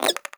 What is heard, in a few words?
vox twitch, creatures, snark